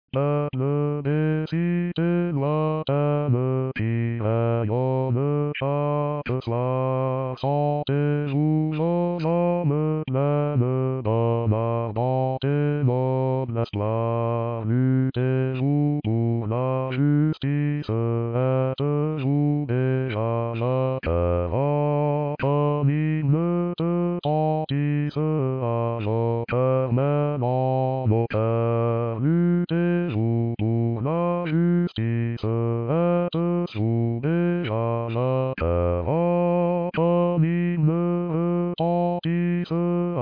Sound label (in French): Basse; HymneEurope